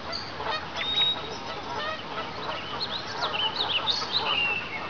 geese

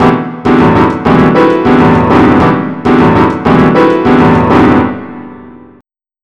BOSSA; BUSKER L

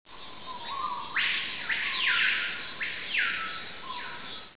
new game; yaac

